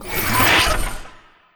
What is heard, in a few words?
Fire Cat Action